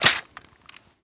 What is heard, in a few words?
bolt forward; Rust